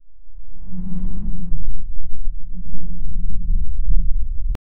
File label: dark ambient background